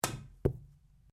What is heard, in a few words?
Billiards Cue Cushion, Home billiards table; Pool Sound Effects; Pool (Sound Effects:Sports)